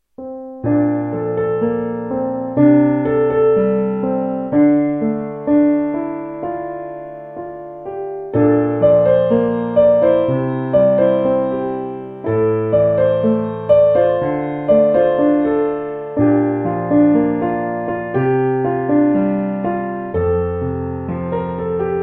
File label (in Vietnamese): piano khong loi